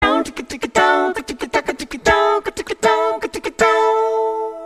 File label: Strum Sound Effect Acapella